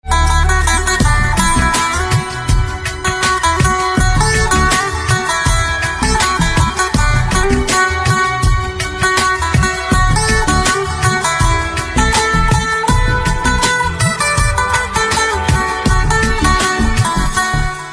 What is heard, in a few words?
Sitar, instrumental ringtones